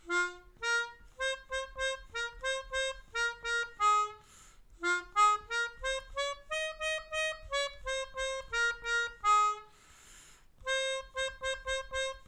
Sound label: Melodica